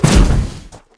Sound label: recall poof; smite; kuzenbo